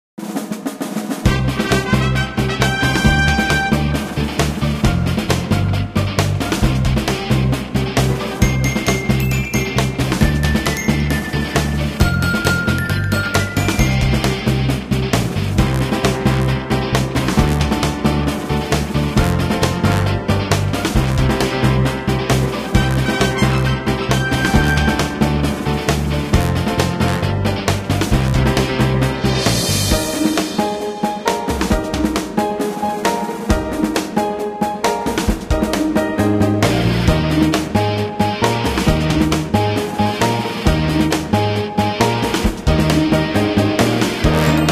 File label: Battle Loop; guns n glory wwii android game music